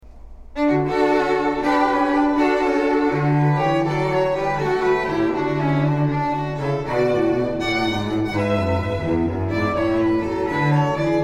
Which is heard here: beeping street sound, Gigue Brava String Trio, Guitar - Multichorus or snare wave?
Gigue Brava String Trio